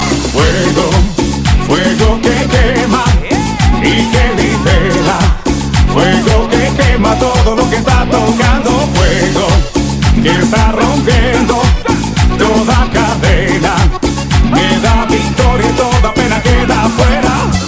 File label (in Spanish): cumbia; christian